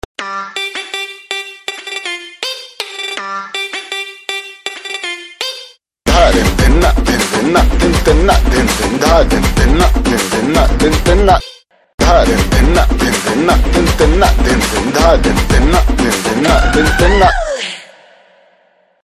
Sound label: BGM Ringtone